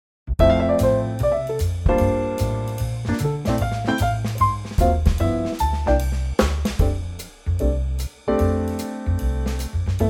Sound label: jazzville